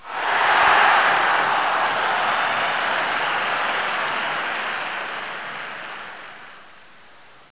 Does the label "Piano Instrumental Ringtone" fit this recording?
No